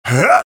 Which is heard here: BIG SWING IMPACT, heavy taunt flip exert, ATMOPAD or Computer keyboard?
heavy taunt flip exert